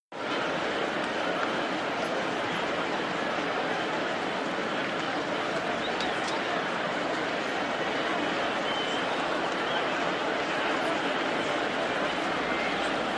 Football Crowd Busy, sports arena football

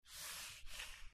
Short Squeaky Mouse

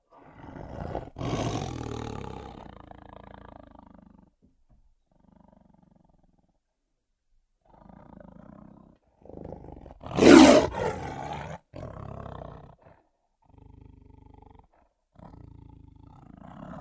Tiger Growl Growls; big cats

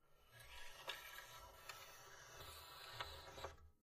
Antenna; Telescoping Antenna Up And Down, Slow.

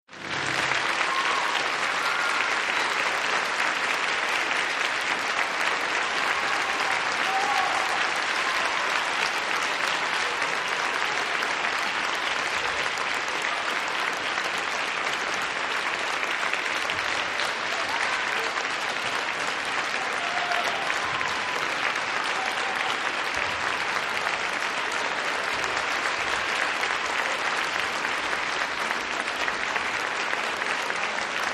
Elementary School

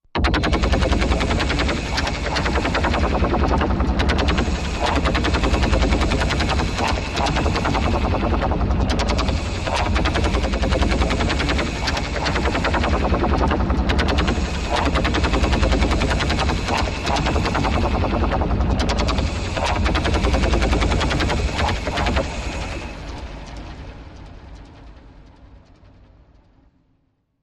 Circular Destabilize, machines winches

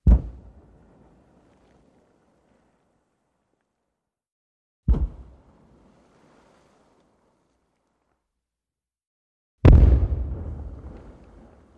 Underwater Explo Big

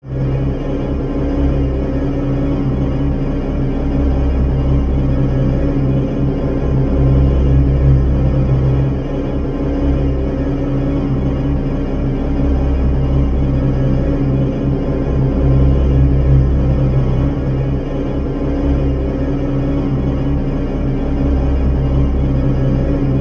Uneven Pulsing Orch, airy

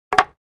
2x4 Plank Dropped On

